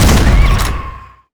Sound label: fire load; hawaii